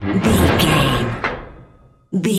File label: metal scrape deep grind squeak